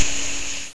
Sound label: sizzle; ExtractIt! for Stacks 4.0